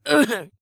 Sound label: pain, Zombies; zps; Vanessa